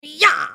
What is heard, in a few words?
spell cast effort; Heimerdinger